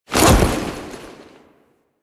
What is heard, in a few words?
Foley Melee Sweep; Warframe; Kahl